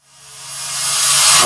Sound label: REVRS CHAINA; AKAI S-Series CD-ROM Sound Library VOL-1